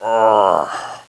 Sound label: DYING